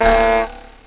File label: Blow